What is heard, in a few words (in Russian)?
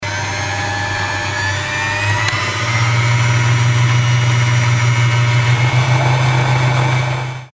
namatyvanie niti zvuk